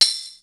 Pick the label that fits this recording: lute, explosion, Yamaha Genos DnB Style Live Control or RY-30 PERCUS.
RY-30 PERCUS